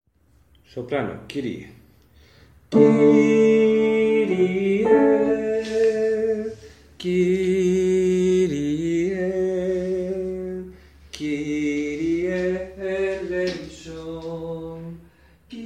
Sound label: Kyrie sop